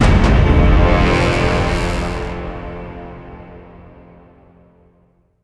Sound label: Combat High Outro Short